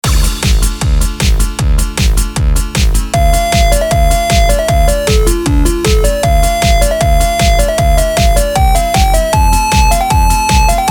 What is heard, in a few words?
music